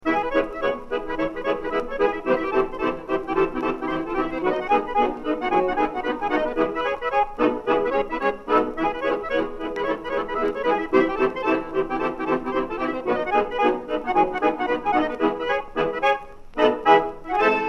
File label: accordion duet; pietro deiro anthology; accordion ola duet